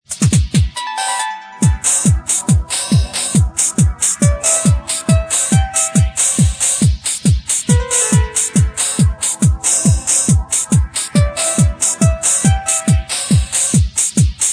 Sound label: piano